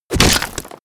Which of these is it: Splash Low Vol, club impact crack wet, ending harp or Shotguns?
club impact crack wet